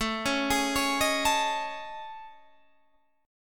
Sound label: A Major 7th Flat 5th, down Guitar DADGAD